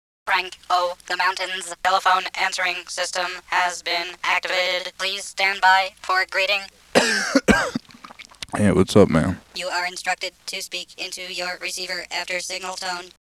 techno; ans machine

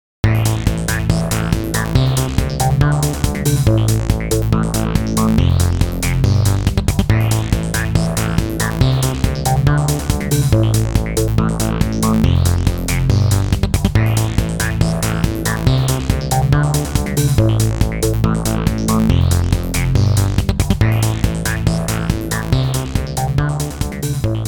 sytrus test

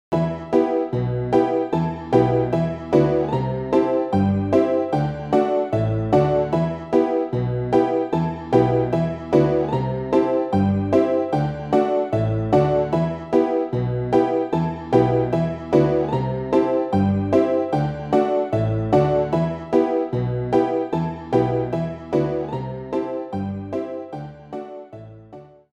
Skeleton Playing The Piano

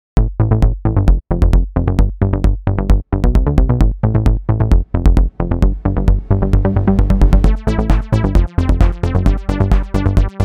simple dance hit

